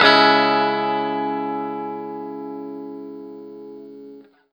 PRS CHRD MIN, Guitar; 90 s Sample CDs; Quantum Leap - Guitar & Bass

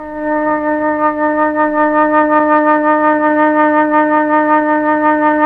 FLT, Melotron Flt, Tron Flutes

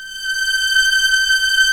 V STR F#4, PROPHET 5; Greytsounds VOL-1

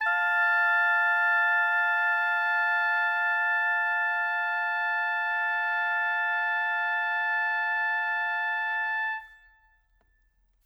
Oboes

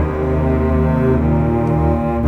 Bass Cello, Cinematic

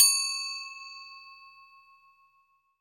SMALL BELL, Latin Hand Perc; E-MU Producer Series Vol. 2; More Studio Essentials; Composer

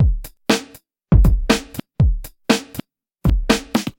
Bonus Beat, Drums

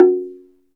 SLIT DR F#4, Heart of Africa; SLIT DRM1

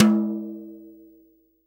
TOM, Real Drum Kit, SWING KIT

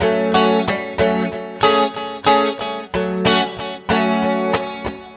Electric Guitar; Sonic Foundry (Sony Creative Software); Mac Money; Guitars

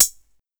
HiHat Closed; Drums

